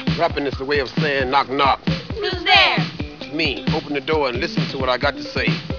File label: rapping